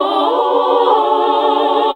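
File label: Classical Sing, choir; Best Service Gigapack 1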